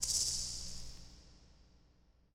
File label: hiss